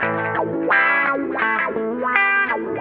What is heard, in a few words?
GUITAR